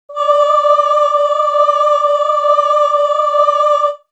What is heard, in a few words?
Synth; Coldcut's Kleptomania VOL1